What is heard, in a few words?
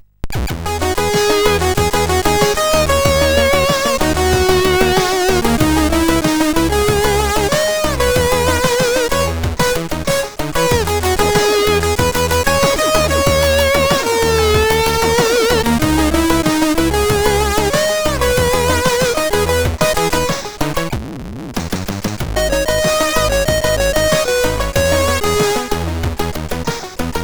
Echoes, sid CSG8580R5